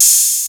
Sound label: HIHAT; SoundFactory; SMPMANIA